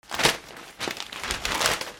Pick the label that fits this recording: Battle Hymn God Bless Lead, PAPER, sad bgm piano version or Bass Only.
PAPER